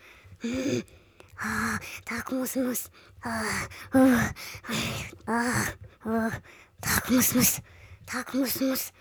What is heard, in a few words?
Idle Loop At Rest